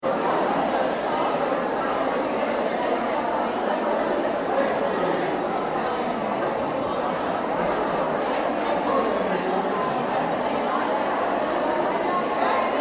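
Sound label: Crowd Women's Conference Lobby Busy; Backgrounds; Big Room Sound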